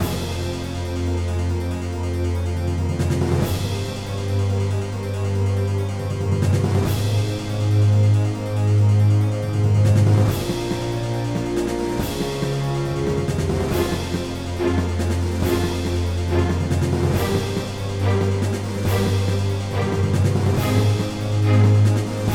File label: Battle Theme